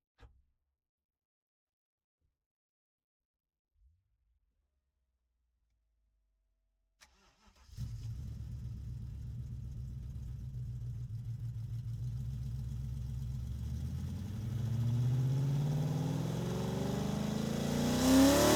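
Pantera BMW onboard startup var driving shutdown engine back, De Tomaso Pantera 1972 with BMW Alpina V8; Pole Position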